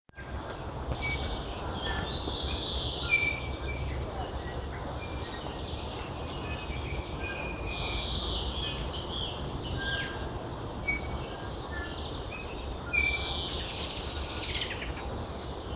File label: Birds of New Zealand noisey recording